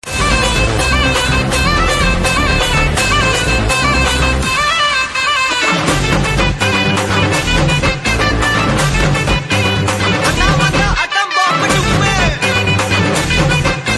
Telugu Movie Ringtones

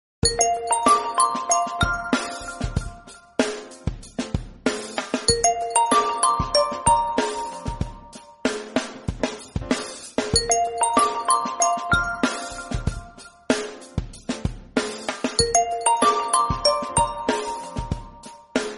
Rhythm Oneplus Message Ringtone; ringtones

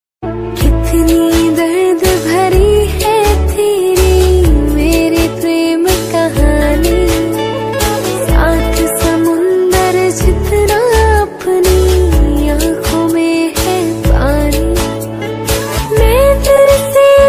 Sad Ringtone

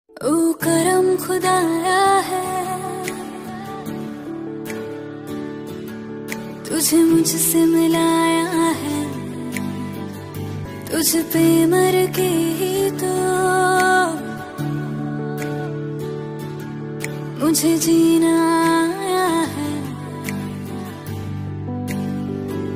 Ringtone Female

